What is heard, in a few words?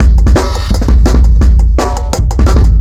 Bad Beat, Drum n Bass; Beats and Percussion; KJ Sawka; Drum n Bass & Beyond